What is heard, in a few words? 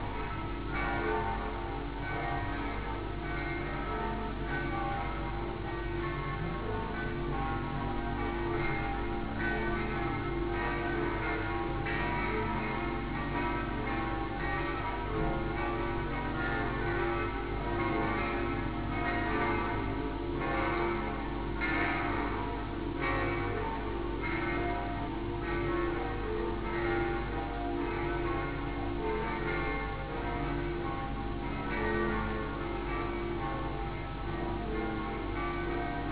bells, Venice